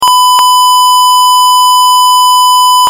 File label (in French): signal carre 100Hz